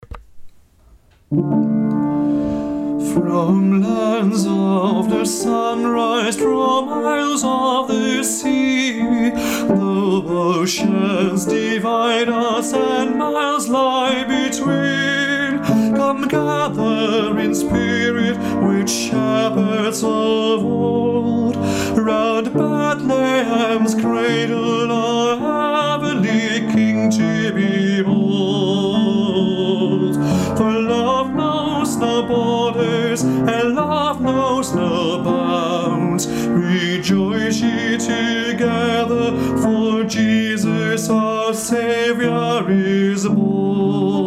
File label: Love Knows No Borders tenor